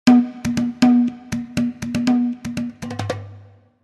Indian Drums

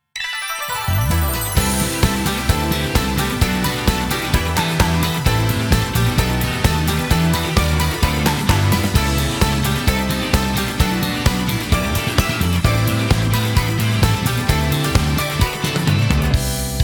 Instrumental; Destiny Child Original Soundtrack Pt. 2

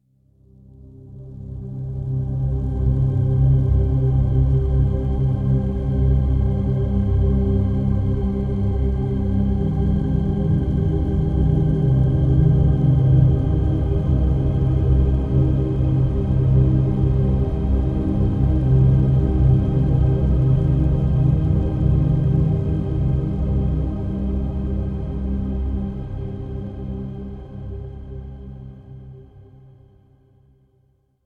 Stinger Exploration Drone

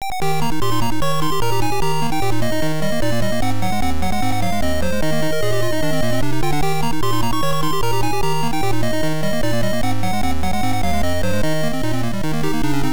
Pirate Ship; higemaru makaijima nanatsu no shima daibouken; msx2

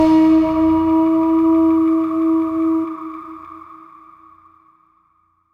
Ballistics End; trials of the blood dragon

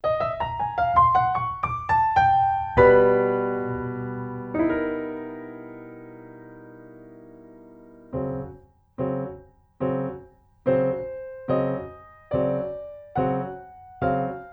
An Ominous Stroll (Piano); cuphead the delicious last course limited edition original soundtrack 2023

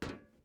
metal step; teardown; ps5 windows xbox series xs

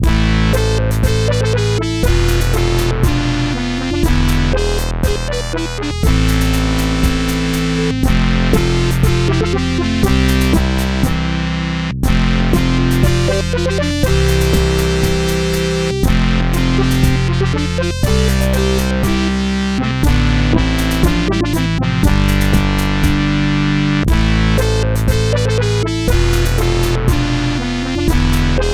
Sad Dying Song; Ken's Labyrinth

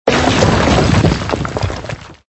Smash Stone

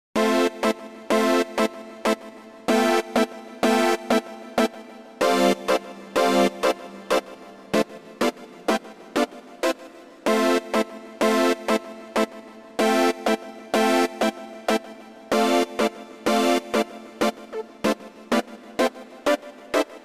Poly Stacks

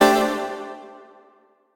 Tessellation Clear F1; Mixolumia

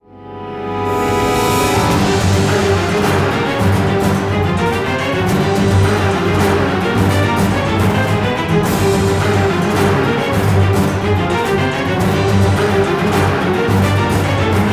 bgm boss

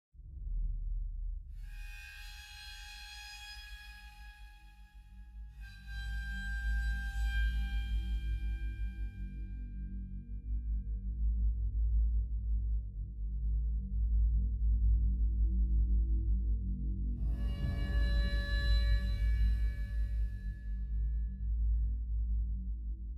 String

